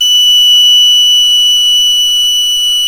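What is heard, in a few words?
PENNY WHISTLE; Exotic Winds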